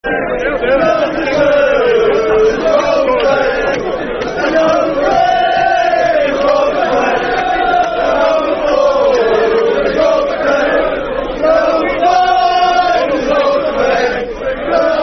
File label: Chant Football Chants; Soccer Song; Robert Fleck Chant; Chelsea Cfc